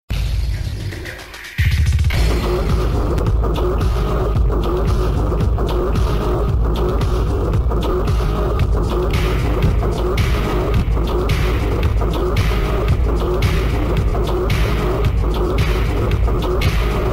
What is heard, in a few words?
technoise; Asphalt Urban GT 2; n-gage